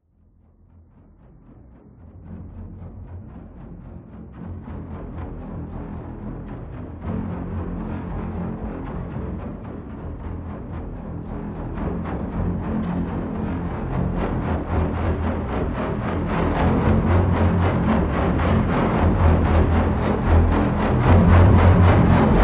Cinematic Abstract Orchestra Riser Brass Strings Synth